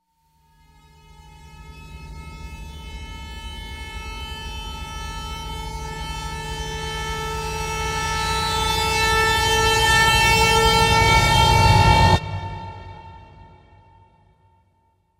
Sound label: Trailer Tools Tonal Riser; Production Music; CATastrophic